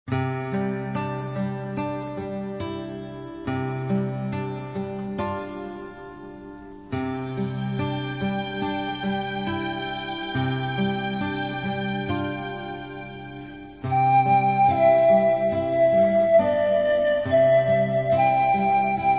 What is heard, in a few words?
Orchestra Panpipes